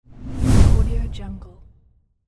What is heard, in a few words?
whoosh